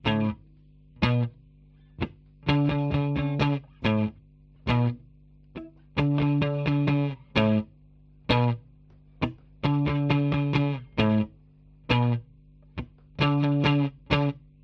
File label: Clean